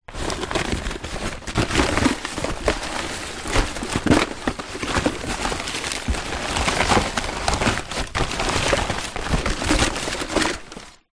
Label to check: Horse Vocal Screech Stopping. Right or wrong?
Wrong